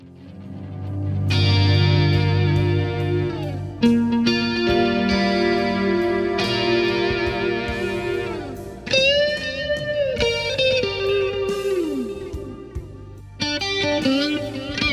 no plate reverb; Eclpse

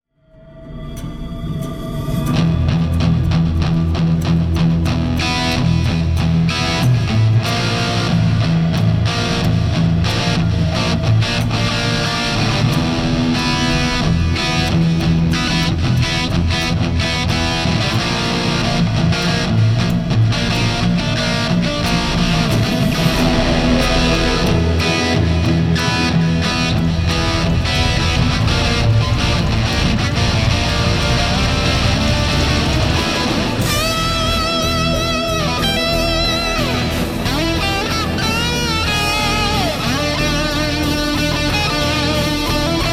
Compulator

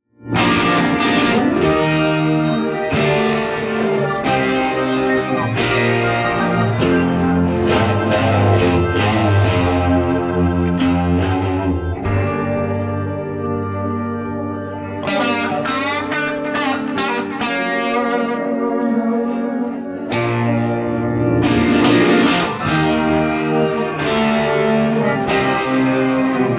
verse guitar extraction

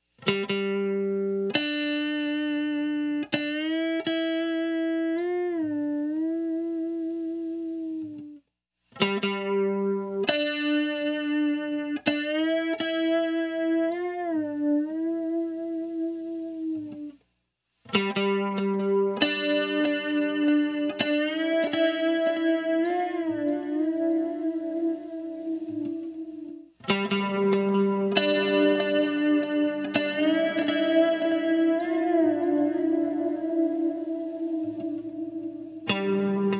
Intro Tone Building, Reeves, Bassman; Dyna; CE2B

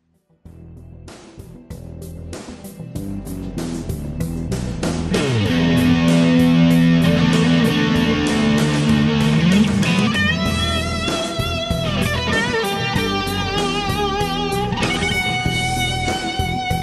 solo

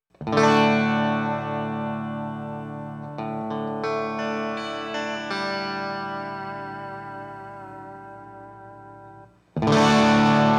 tone building HM2 Boogie CE3 Nova

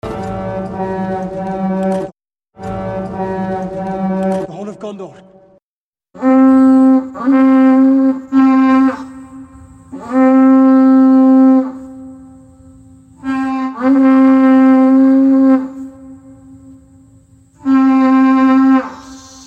Horn

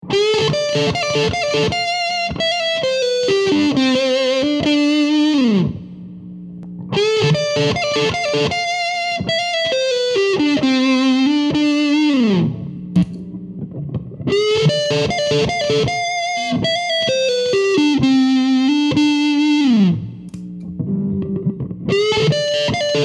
CLONE LEADS

